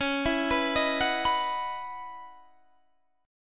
C sharp Minor 11th C sharp, Guitar Half Step Down

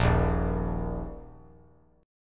D sharp 5th; strummed; x,x,1,1; JGuitar

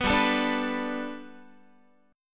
G Major Add 9th Guitar, chord

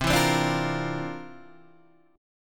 C 11th, C, down, Guitar, DADGAD

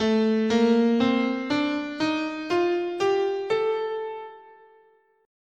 A Locrian

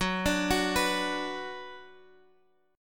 F sharp Suspended 4th Sharp 5th, Guitar, Standard; arpeggiated; 14,17,16,16,x,x; down

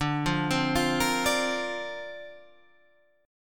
D-Minor 7th Sharp 5th D Guitar Open G, chord

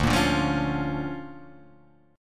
D Minor Major 7th Sharp 5th, D

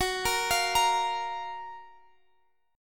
Gb Major 7th, Guitar Standard; arpeggiated; capo 9; chordshape; x,x,16,15,18,18

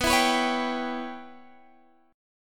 C sharp Minor 7th Flat 5th B

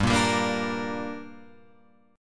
G Minor G down Guitar Open F, chord; strummed; JGuitar

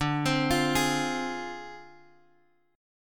D-Minor 7th Flat 5th, D, Guitar, Open G

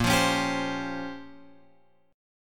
A sharp Minor 6th Add 9th A sharp, Guitar chord; A#m6add9 Chord {6 8 X 6 8 8} strummed

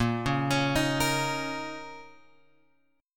A sharp Minor Double Flat 5th; A#mbb5 Chord {6 4 X 6 4 6} arpeggiated

